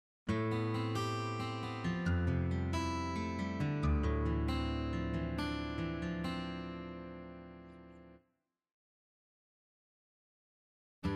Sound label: Guitars